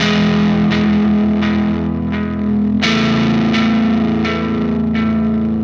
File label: Static FX Guitar